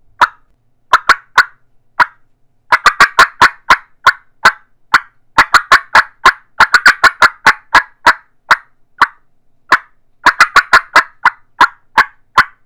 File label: batwing III cutts, turkey; Southland Sounds